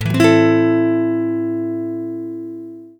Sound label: Am7